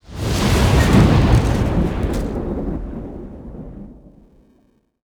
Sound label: car explosion